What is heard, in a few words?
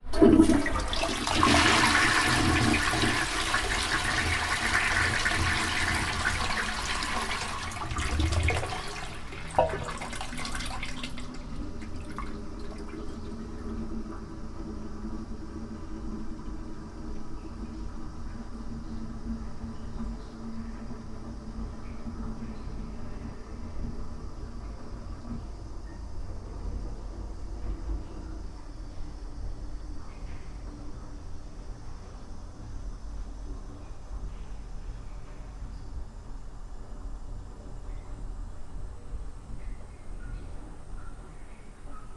toilet flush european distant lid up; sonniss gdc